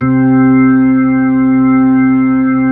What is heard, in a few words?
B-3, Keyboards